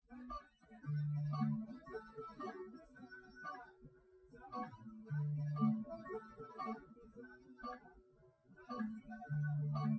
nodrums, liverec, src; SiSEC08; SiSEC underdetermined

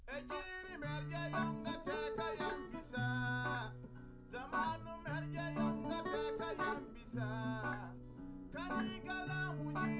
no drums live rec 250ms 1m mix; SiSEC10; underdetermined

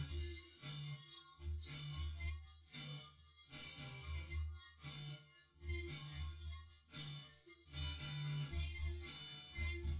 wdrums synthconv; SiSEC10; underdetermined

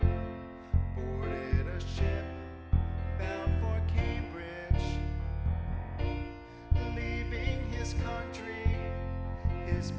nodrums inst mix

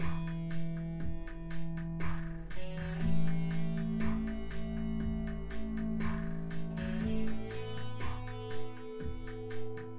wdrums synthconv 1m mix; SASSEC07

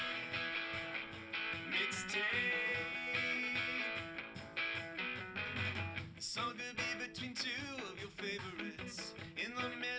wdrums liverec 250ms 5cm sim

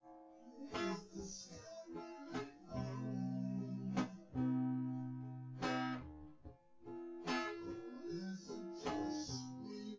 no drums, synth conv, 250ms, 5cm; SiSEC10; underdetermined

